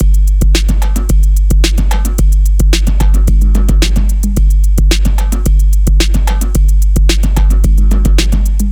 Drum Loop - 110 BPM, Experimental; Weekend

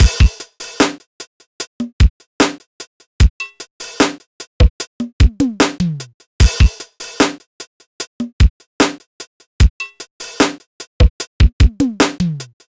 Nostalgia Drum Loop - 150 BPM; Cymatics - ANALOG EVOLUTION Beta

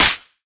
Clap; Cymatics; Future Bass Starter Pack; Drums - One Shots; Heater

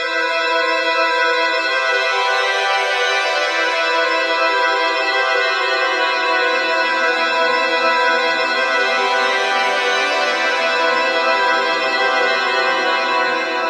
Pad E Swimming; Trap and Dubstep

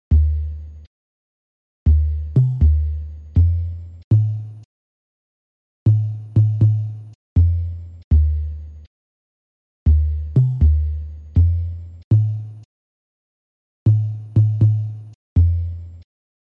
120 BPM G Min Percussion Loop, vintage RnB; Cymatics; Blue Eyes

